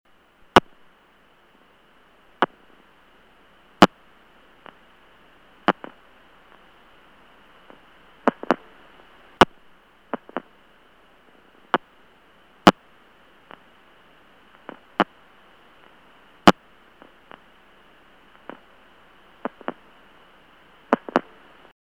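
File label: Telephone line crackle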